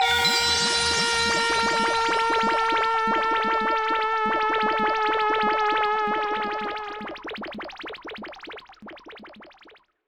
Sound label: Bugle Friendship